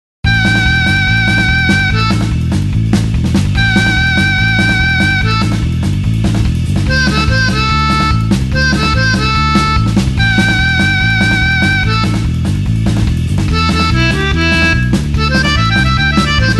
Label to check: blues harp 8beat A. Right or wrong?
Right